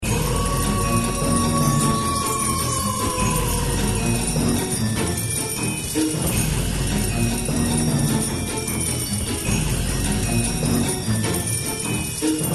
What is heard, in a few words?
Win Animation; slots; Halloween Blast